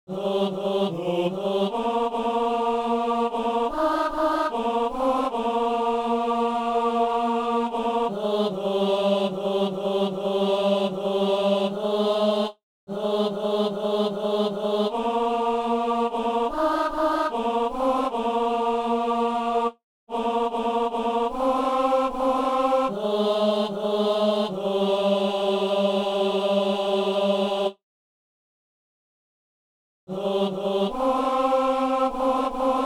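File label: tenor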